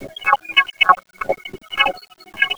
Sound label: GROOVE; RYTHME; USB Soundscan vol.33; AKAI; Disjointed Textures